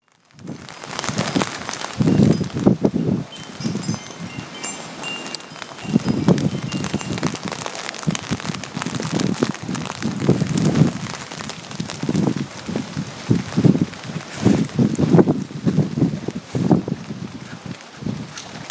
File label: campanelli